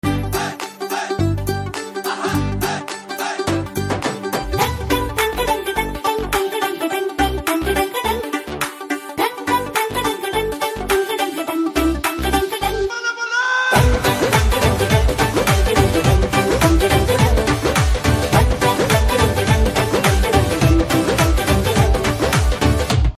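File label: Starting Music Telugu Ringtone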